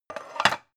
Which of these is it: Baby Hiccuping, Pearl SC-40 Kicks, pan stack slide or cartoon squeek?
pan stack slide